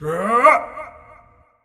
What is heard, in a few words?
Effort Long; Marv